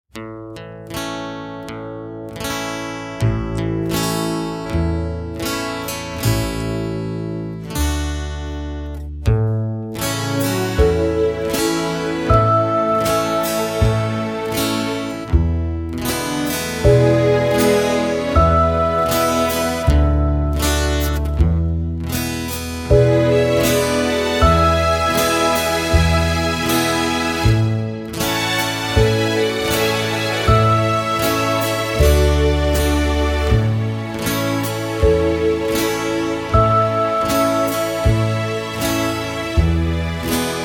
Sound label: No Backing Vocals